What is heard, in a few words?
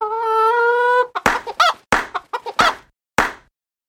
Chicken; Skywrath Mage